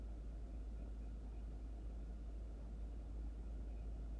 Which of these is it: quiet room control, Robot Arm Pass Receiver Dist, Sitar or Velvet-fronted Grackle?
quiet room control